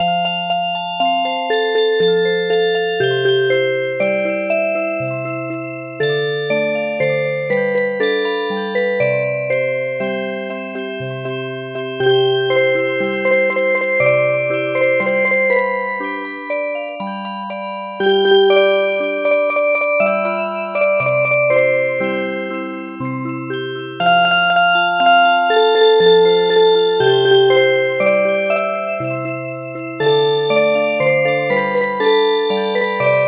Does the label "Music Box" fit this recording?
Yes